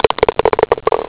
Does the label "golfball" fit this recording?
Yes